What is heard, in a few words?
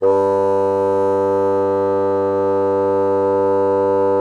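bassoon forte G3; Xsample VOL-6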